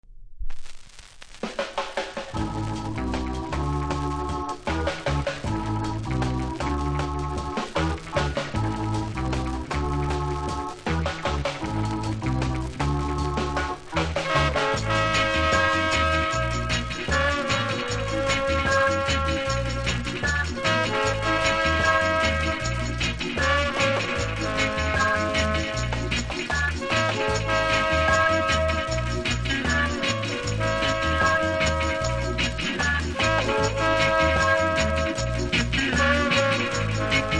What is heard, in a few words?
heavy rock